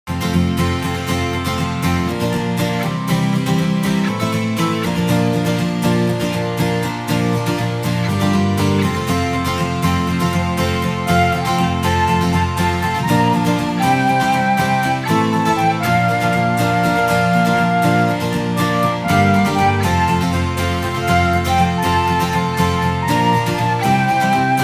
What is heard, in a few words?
INSTR